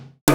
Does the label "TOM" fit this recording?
Yes